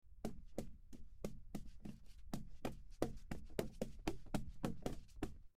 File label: Feet stuttering